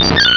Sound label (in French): Cri